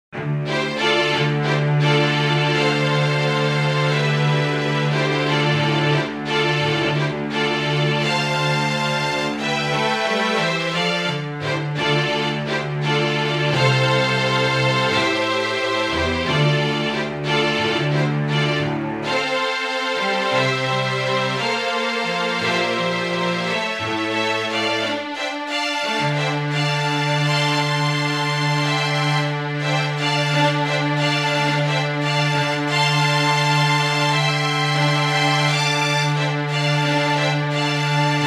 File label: String D; Jeff Hartman